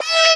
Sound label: E stab; funky; Loopmasters; Funky Underground Vol 2